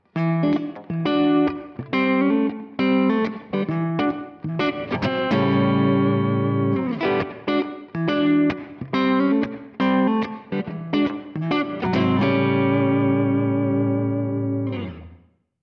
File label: Reverb Room